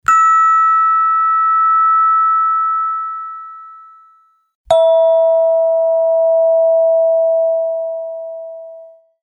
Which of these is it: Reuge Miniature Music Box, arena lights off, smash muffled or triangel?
triangel